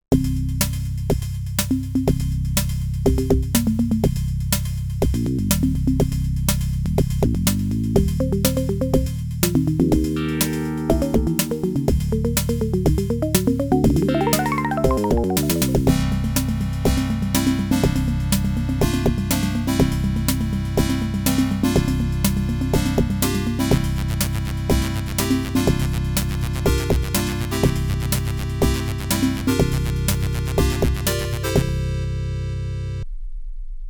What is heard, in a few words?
ADLIB OPL2